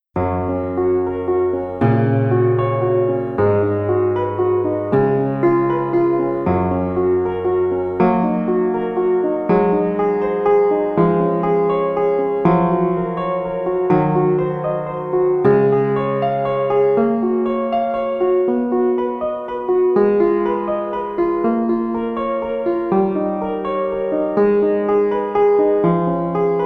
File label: Pianoforte, Praeludium; Johann Kuhnau